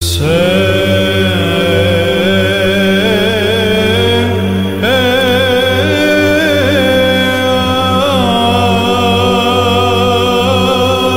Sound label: Greek Orthodox